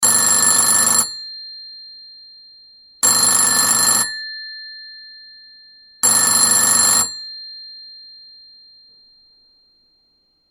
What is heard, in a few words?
old ringtone